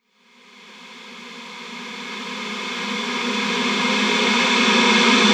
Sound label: reverse, vocal sample